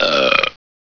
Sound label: burp; simpsons